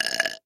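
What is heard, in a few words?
burp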